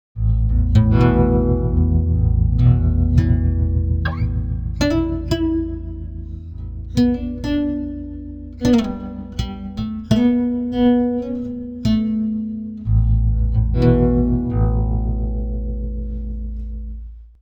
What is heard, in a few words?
pitchshift guitar, -12; i3 dsp quattro; i3 dsp quattro x